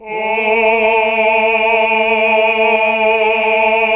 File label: Basses; Sound & Vision - Gigapack II CD 1 (Roland); HAL Greg. Basses